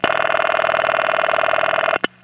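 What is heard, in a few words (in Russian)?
ring on ancient telephone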